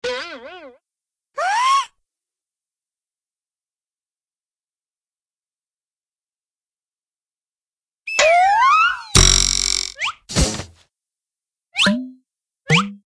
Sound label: play dead; toontown-classic